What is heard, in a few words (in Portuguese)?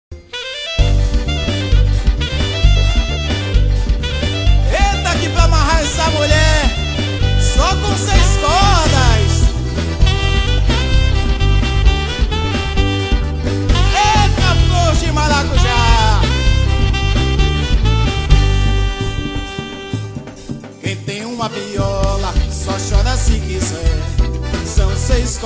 seis cordas